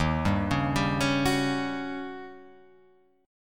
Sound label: Eb 7th Sharp 9th, Eb7#9 chord; Eb7#9 arpeggiated; x,x,1,0,1,2,3,3; JGuitar